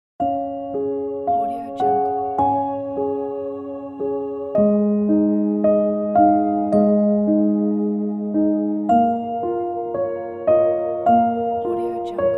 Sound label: lullaby